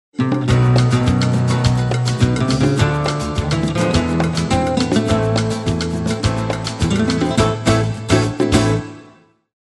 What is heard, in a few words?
Mambo, Nokia Ringtones; Original Ringtones Nokia 6101